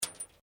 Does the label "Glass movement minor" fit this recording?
Yes